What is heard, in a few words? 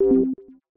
message; Euro Truck